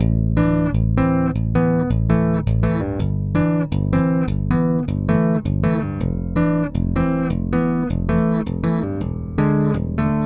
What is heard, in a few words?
WA-2A Bass Chords Original